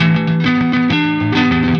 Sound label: Electric Guitar, Loops; Guitars